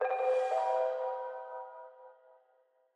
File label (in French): succes; sante-social